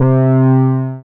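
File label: synth analog; Best Service Gigapack 1; SYN 02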